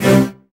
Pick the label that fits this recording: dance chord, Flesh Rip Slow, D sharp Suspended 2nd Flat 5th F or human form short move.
dance chord